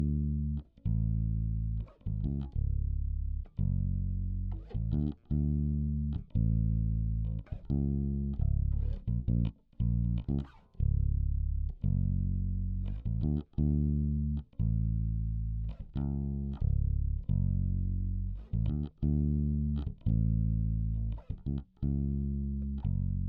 Bass DI

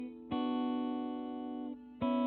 GTR R84

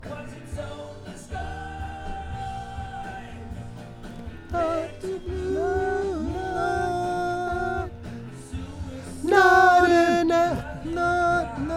VOX Back

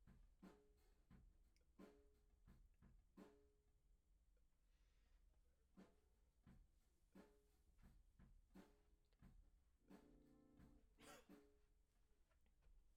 Act Guit R

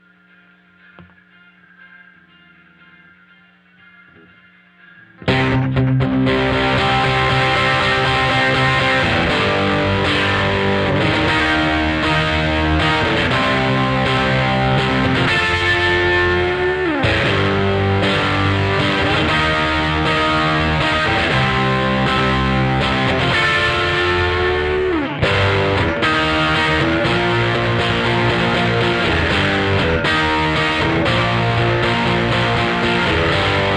Guitar

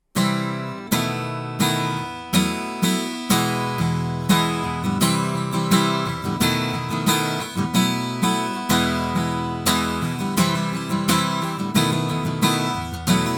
Act Guit L

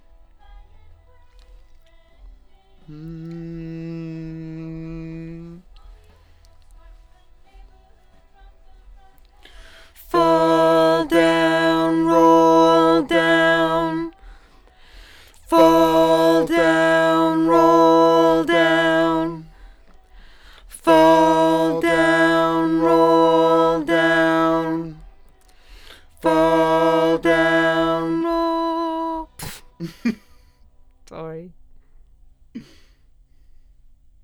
LdVOX Double

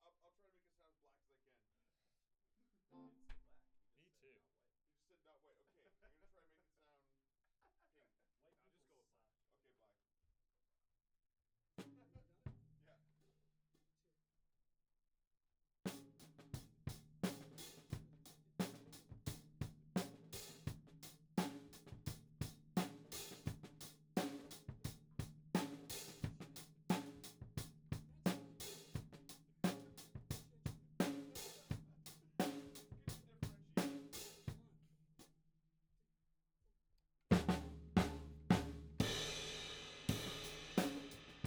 Tom1

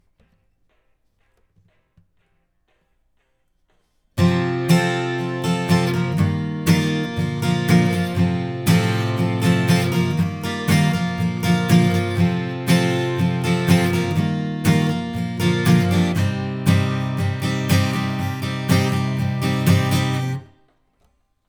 Ac GTR

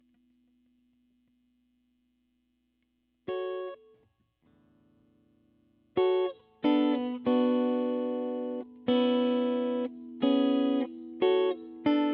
GTR

